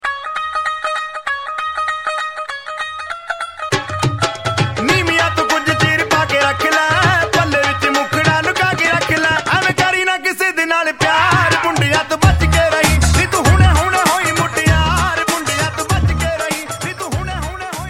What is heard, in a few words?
punjabi